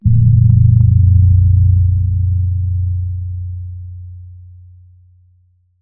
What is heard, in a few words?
conversion